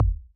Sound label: Ball Collision Geo Tackleball Extra Light